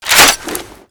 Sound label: m203 chamber open, foley; gmod; viper